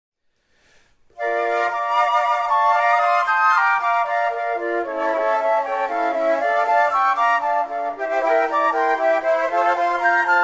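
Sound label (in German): Stille Nacht x 4 flutes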